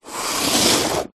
industrial tool box small plastic slide on screed concrete floor